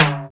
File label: modern clean tom drum one shot D key